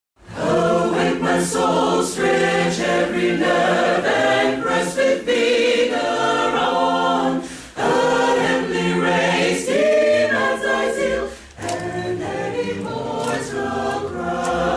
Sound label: choir